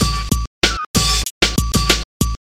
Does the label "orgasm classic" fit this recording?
No